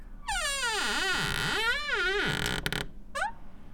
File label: Low Squeak, Door; FXHome; Squeaking